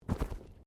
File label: Bodymovement Fall Light